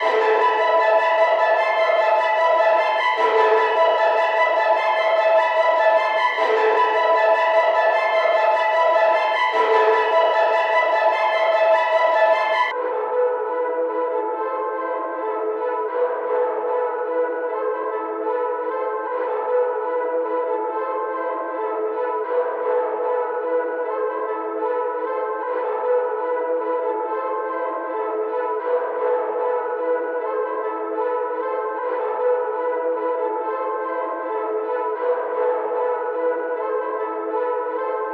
151 BPM G# Min Synth; Trap Melody; Astro

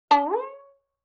Violin Pluck Pitch Rise

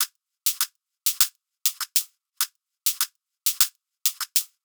Top Crackled, Separated Drum Loops; Ghosthack; Drum Mega Bundle; Abstract Drums Volume 2

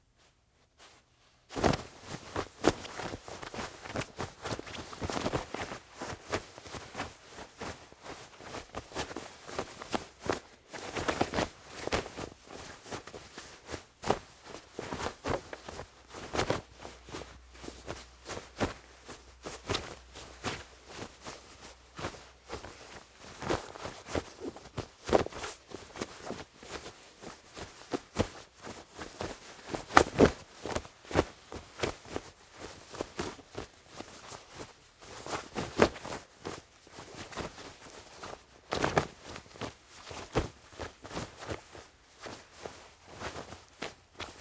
Cloth Putting On Taking Off; Fairlight Sound Lib

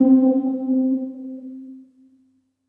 KEYS (C); Slap Space; Cymatics; Orchid Sample Pack

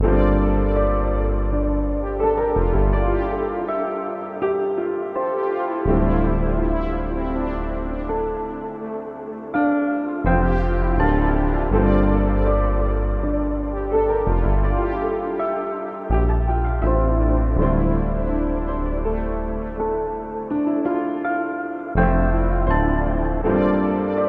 164 BPM D Min; Cymatics; Zodiac III Beta Pack; Zodiac III Production Suite

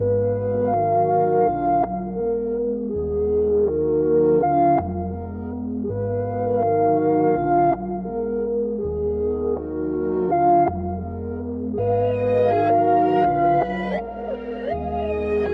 163 BPM D# Min, melody; Homebound; Cymatics; 2022 Melody Collection Platinum Expansion

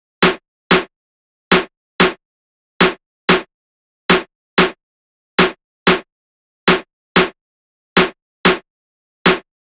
Jammed Drum Loop - 93 BPM Snare, Latin; Loop Stems; Cymatics; PARADOX II - Drum Loop Collection

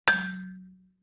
Xylophone Clang G; Musical Elements

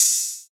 Electron Open Hihat; trap; Hihats; Cymatics; Trap Starter Pack

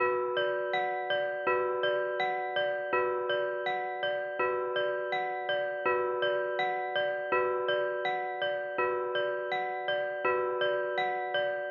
164 BPM F# Min Bell; Loop Stems; Cymatics; Cymatics Gems Vol 12 - Duel

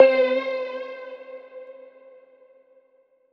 Synth C, Trap; One Shot; Ghosthack; Eerie 150 A#m

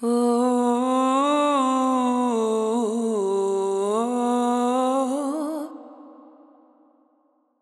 Ohoh, Vocals; GhostHack

